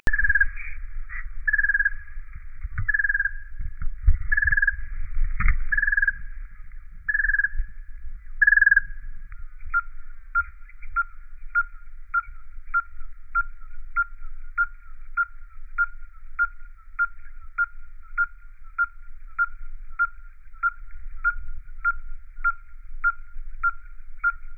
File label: tinkerbird yellow-fronted; Nainokwe; LouisHansen